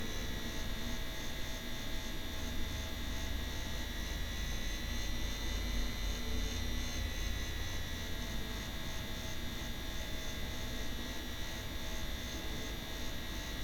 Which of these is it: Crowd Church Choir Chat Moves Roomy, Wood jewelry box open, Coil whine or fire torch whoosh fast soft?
Coil whine